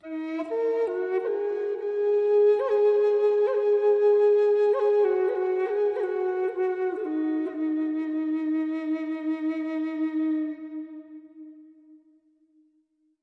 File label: native american echo flute; MELODIC SAMPLES